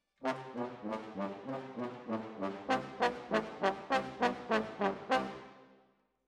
Trombones, jazz for piano

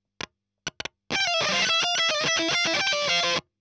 EL Fuzz End

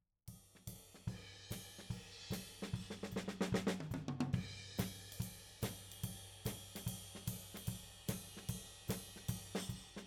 Tom

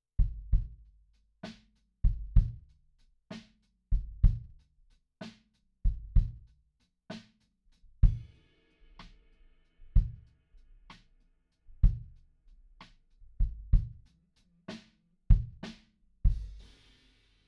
Kick In

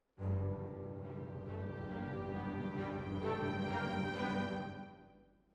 Hall, Jazz for piano

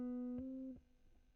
GTR

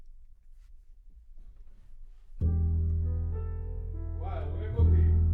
C Bass Bot

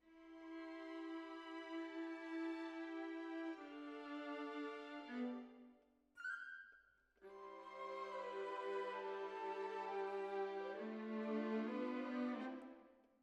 Cellos

